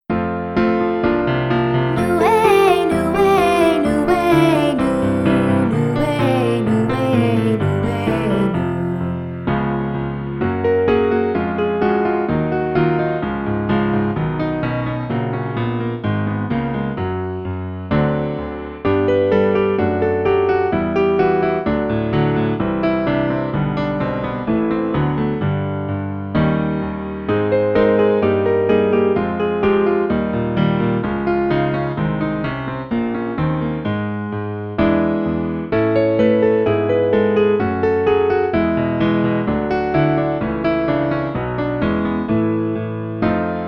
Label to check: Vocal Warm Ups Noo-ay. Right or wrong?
Right